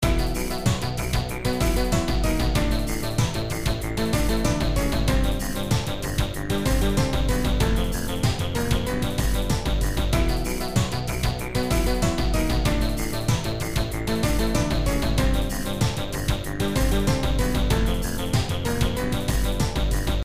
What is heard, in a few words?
electric-techno remix; Super Fighter